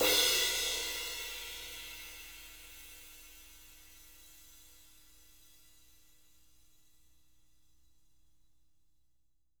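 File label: 18 sizzle crash, jazz crashes, Living Drums; Sampleheads; Peter Erskine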